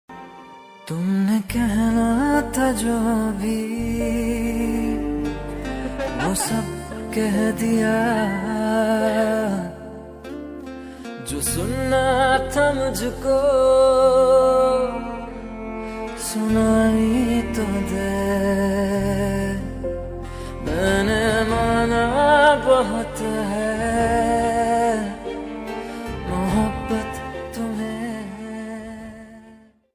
Ost pakistani ringtone

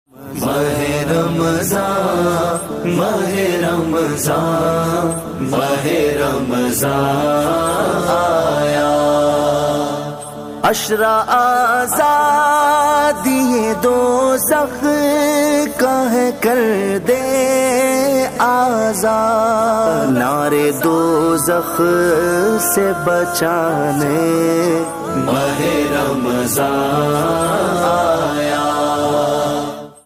islamic ringtone

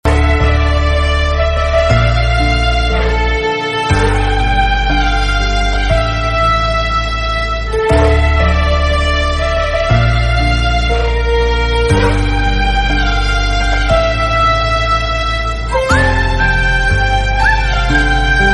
BGM tamil ringtone

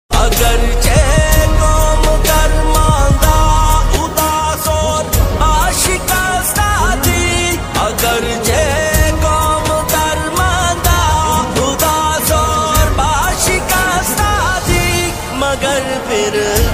islamic ringtone

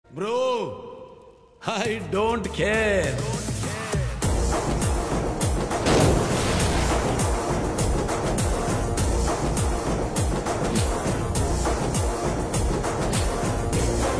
BGM ringtone

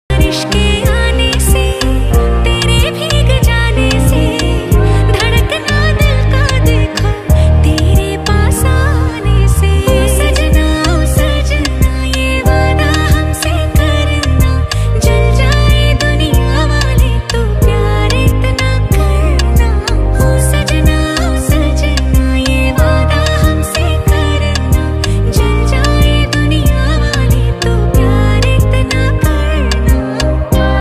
female hindi ringtone

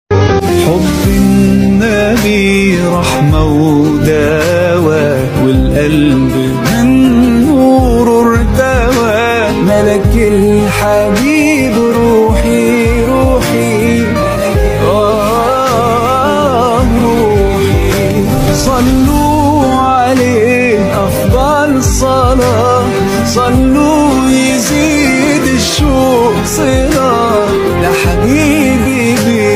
arabic ringtone

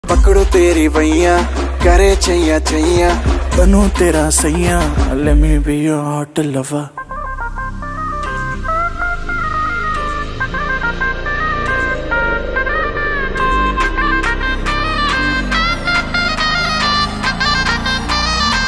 rap ringtone

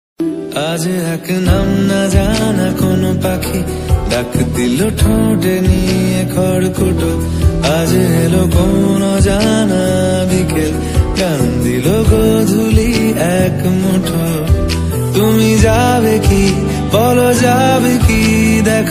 bengali ringtone

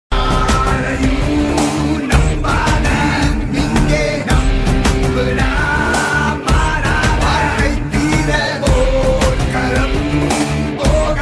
tamil ringtone

